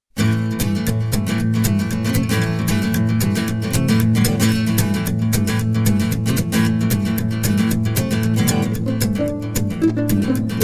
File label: Rhythm Pulse